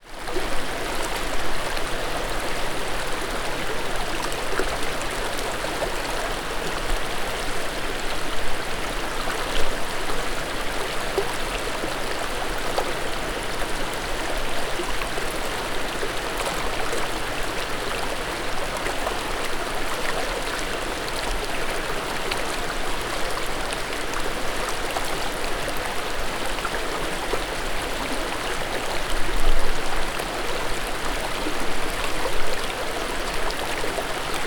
stream

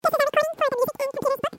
pitch up

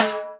Timbale

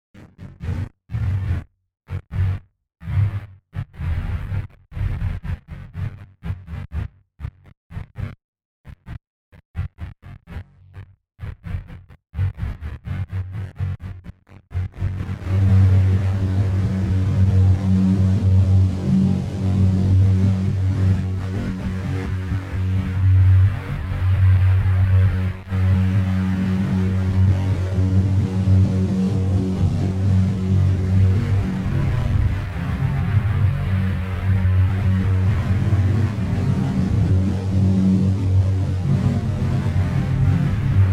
guitar process

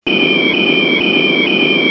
whoosh

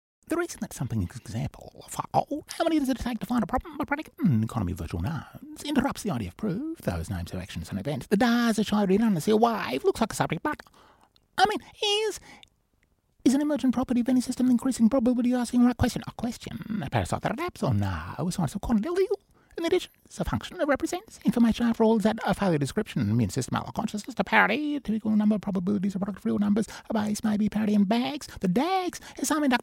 choir

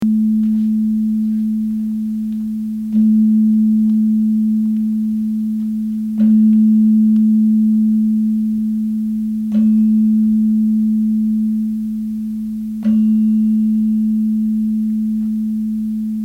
gong

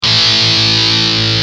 gtr original

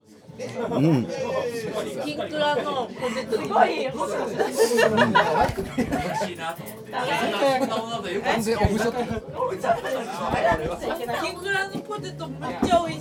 people

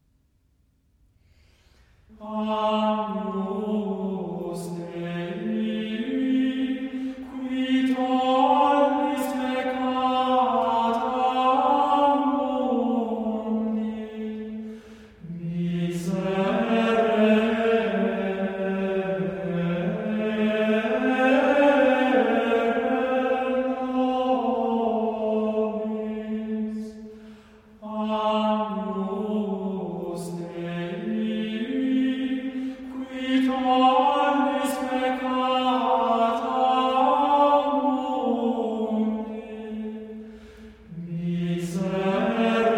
gregorian agnus dei

